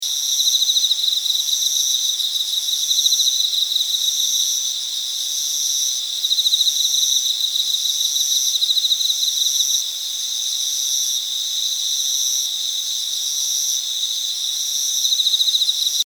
秋の虫 ループ

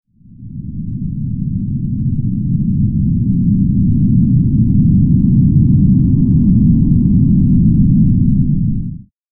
地響き

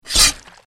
斬撃

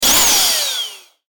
ショット

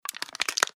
缶 を つぶす

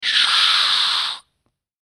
クリーチャー ステレオ

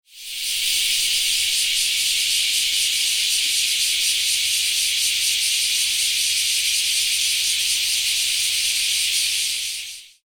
クマゼミ

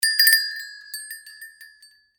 ドアチャイム

